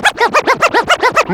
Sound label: scratch; E-MU Formula 4000 Series Vol. 1; Hip Hop Nation; Bonus Perk